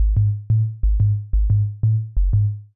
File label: complex analogue sub, hh bass loops